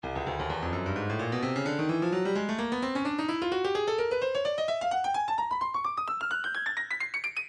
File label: breakbeat midi files 125 129 bpm 129; Stylus; Park Jam